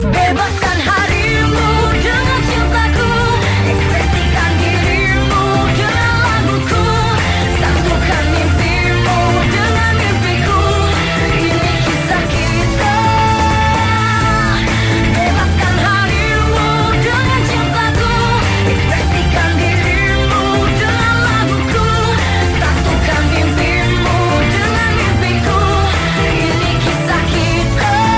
Chorus

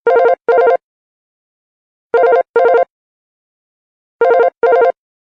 Classic Phone

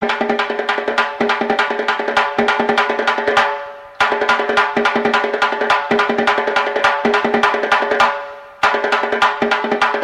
Beat Music

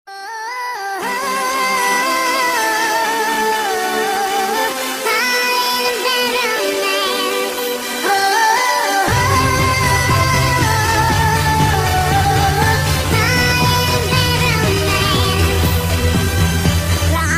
Funny Song

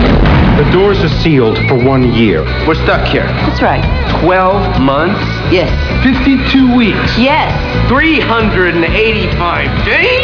Locked Doors

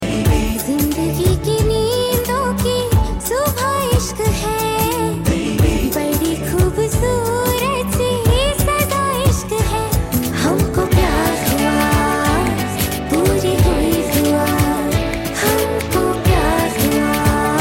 Female

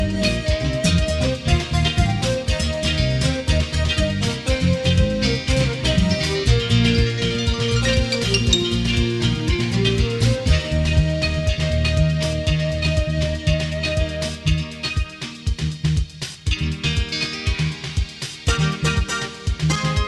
Ethiopian Instrument

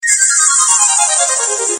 Msg Ting Tong

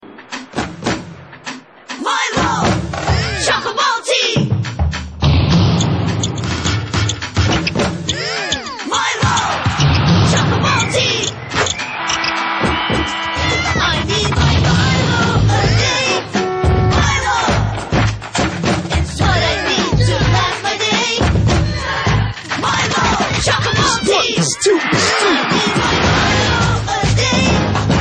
Drumline

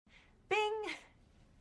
Bing Sound

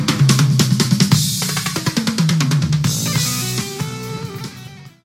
Drum Run

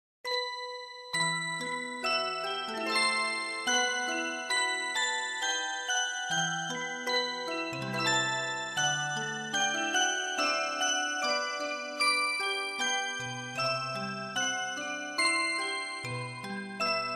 Lullaby

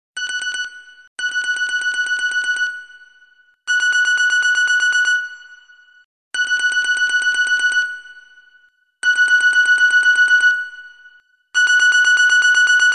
Alarm Nokia Style

